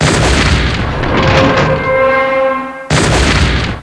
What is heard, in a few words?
die